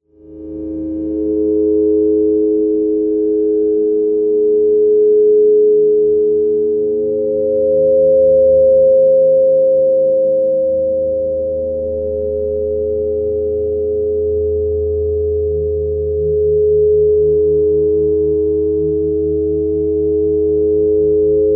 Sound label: drone